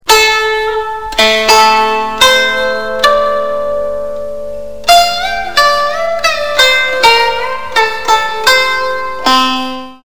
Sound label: china music